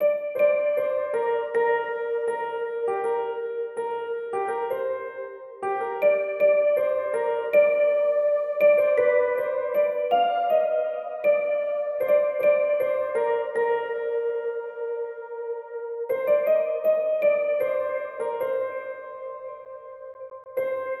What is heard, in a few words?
dream piano D50 melody